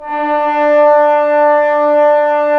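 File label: brass trombones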